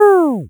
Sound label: tape stop